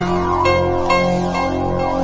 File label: piano